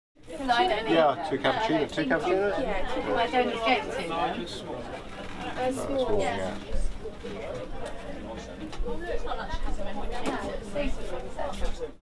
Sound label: Two cappucinos; whitstable